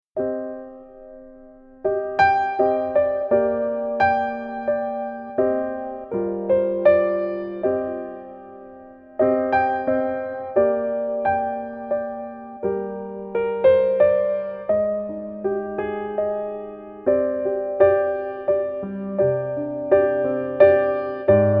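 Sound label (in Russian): Spokojnoe Pianino, calm piano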